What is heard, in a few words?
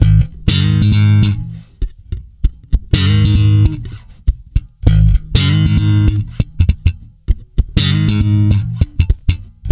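G#, funk swing, bass; Spectrasonics; Bass Legends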